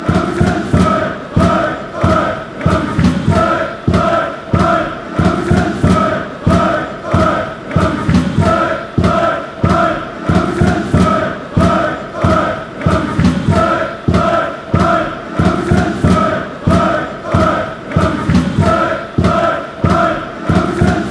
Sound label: aek athens chant